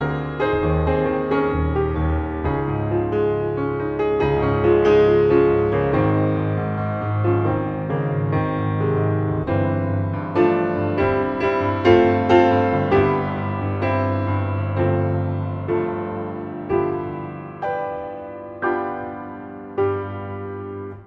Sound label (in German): ueberleitende improvisation am fluegel